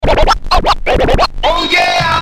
scratch ohh yeah!

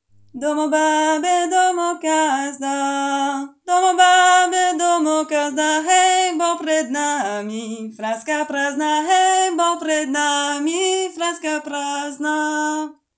baby lead

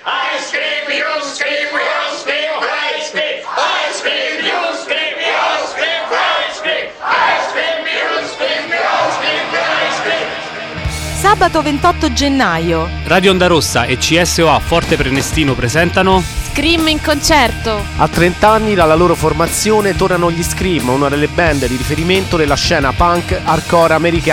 spot scream

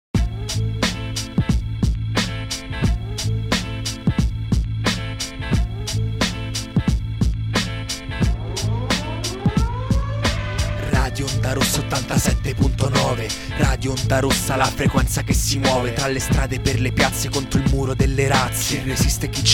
Jingle

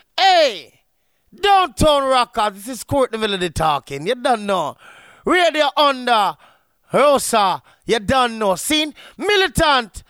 jingle

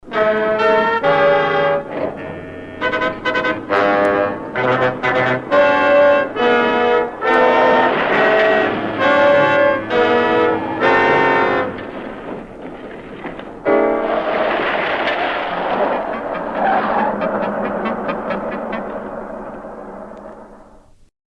fanfare

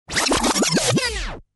SPINBACK

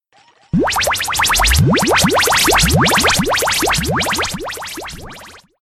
reggae effect

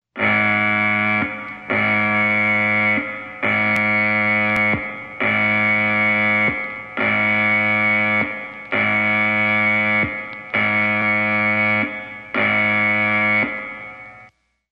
Alarm Buzzer

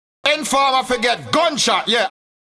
fi get gunshot

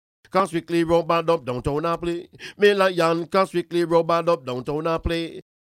Jingle Rub A Dub

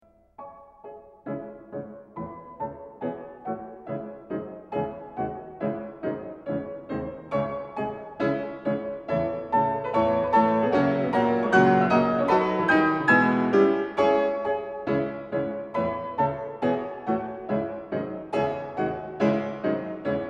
Symphonic Etudes, Piano; Schumann; Op. 13 - Etude #9

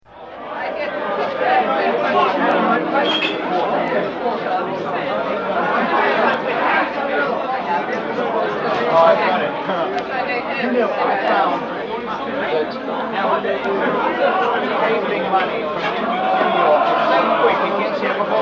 bar crowd noise; ejay

